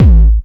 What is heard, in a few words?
Kick, dry perc; Quasimidi Rave-o-lution 309